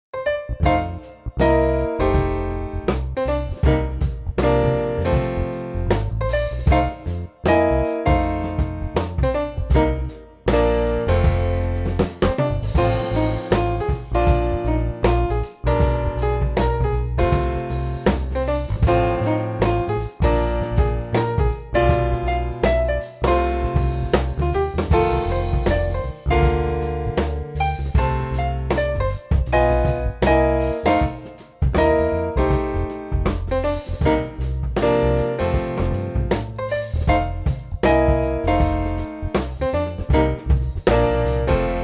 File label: 20 Bars blues, piano